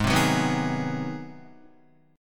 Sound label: Ab 7th Sharp 9th down Guitar; strummed; JGuitar